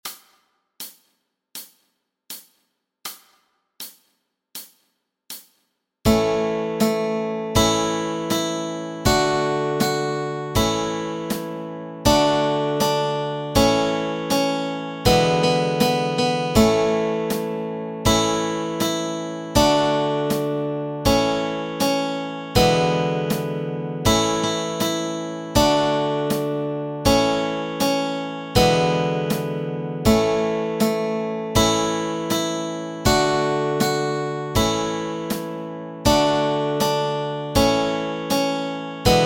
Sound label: Alphabet Song Melody and Chords Track